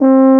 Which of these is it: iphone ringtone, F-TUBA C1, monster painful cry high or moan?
F-TUBA C1